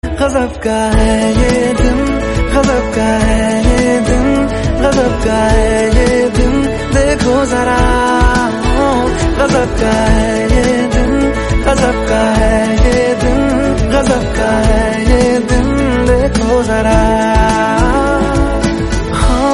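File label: hindi ringtone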